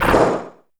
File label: SPLATE, PERC; NorthStar - The Wizard VOL-2; TSAR PERC